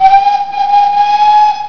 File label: WHISTLE; ALARMS